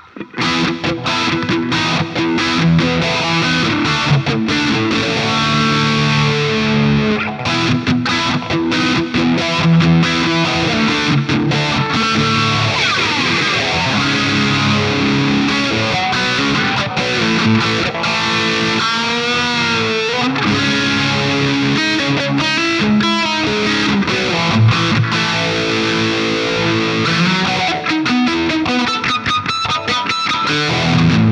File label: Guild Starfire III; Westerly-made; guitar; Guild Starfire III from 1997